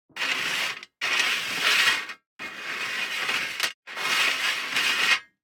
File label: DICE on Metal, Slide, Standard, Six Dice; Samples; ArticulatedSounds; DICE Sfx Party (Extended)